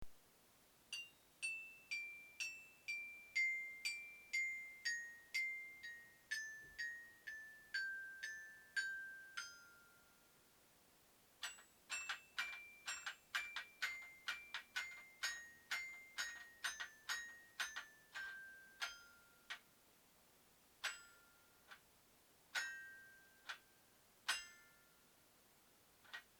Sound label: Xylopiano; toypiano